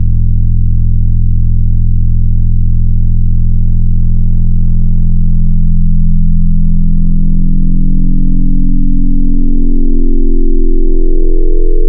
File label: FM, Wavetables